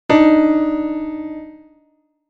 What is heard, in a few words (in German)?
Intervalle simultan